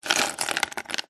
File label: coffee pods in plastic tub rummage movements; nespresso; zapsplat